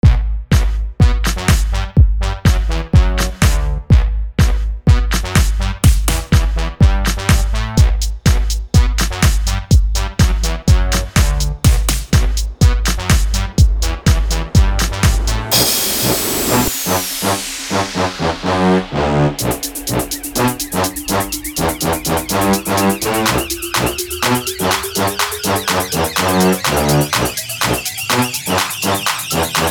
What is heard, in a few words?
Energy song